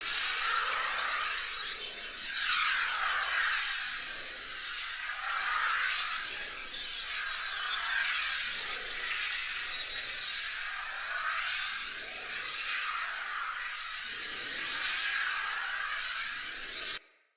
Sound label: Healing Aura Loop; Bromius; Abilities